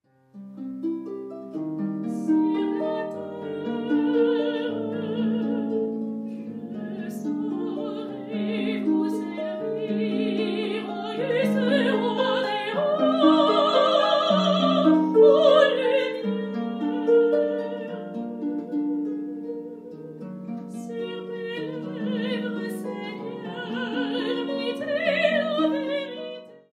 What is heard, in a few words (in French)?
chant et harpe; faure en priere